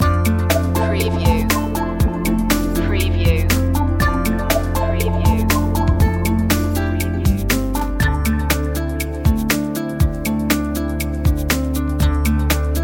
floating guitars loop, chill

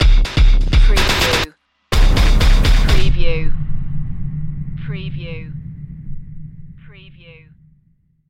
man machine stinger

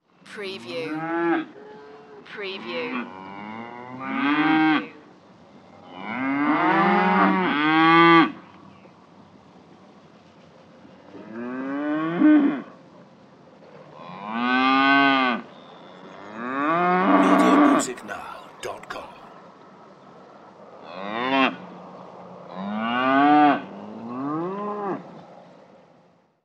Cows Grazing Mooing

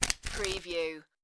Cards Playing Cards Flipping Through

